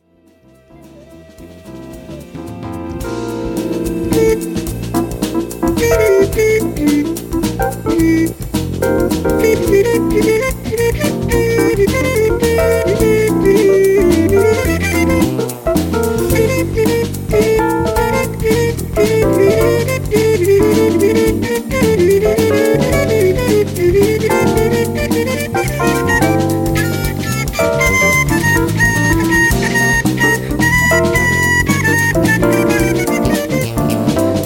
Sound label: flute solo